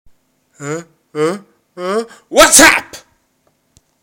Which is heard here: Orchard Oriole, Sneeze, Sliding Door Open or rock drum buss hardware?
Sneeze